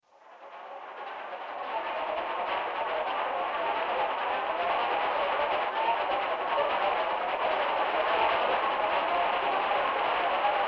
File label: Mine Tension